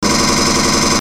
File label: M41A Pulse Rifle; FXHome; Bullet and Gun